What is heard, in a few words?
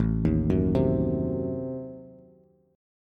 A Diminished 7th A down Bass; Adim7 arpeggiated; JGuitar